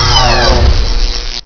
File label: plasma off, weapons; XDM